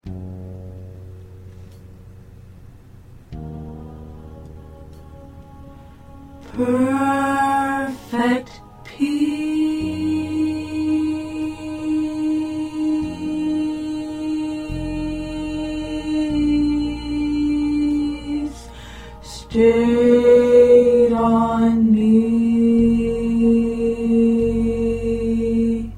Tenor

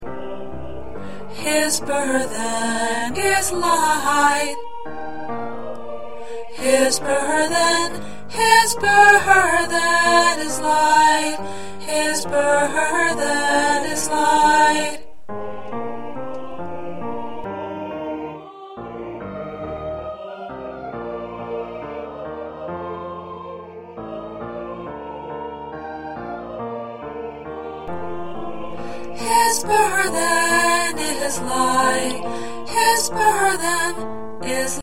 Alto, choir

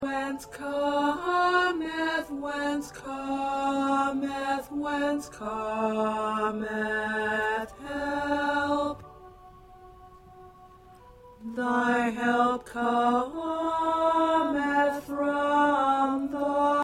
Alto, choir

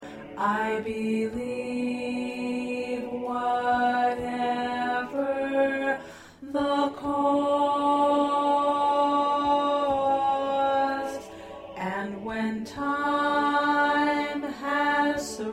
Alto

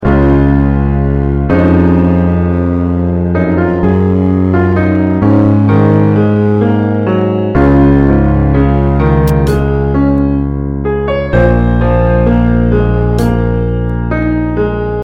Accompaniment

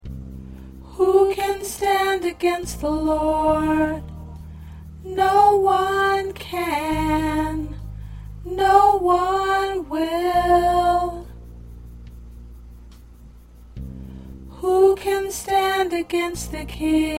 Soprano

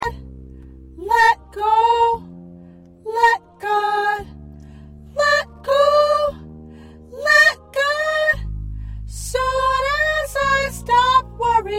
Soprano

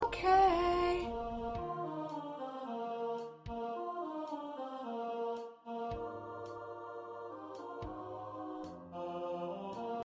Ensemble, choir parts

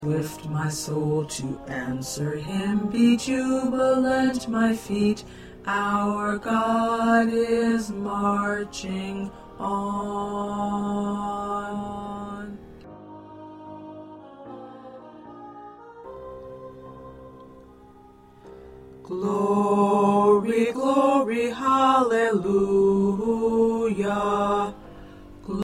Baritone

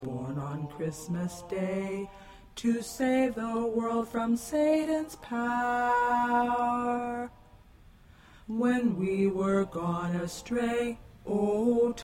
Tenor 2

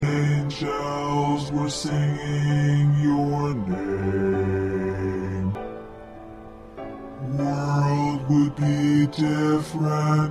Bass

